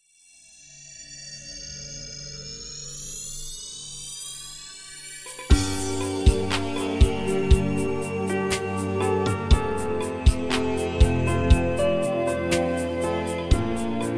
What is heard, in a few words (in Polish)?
taneczny relaks 432 hz; sila osobowosci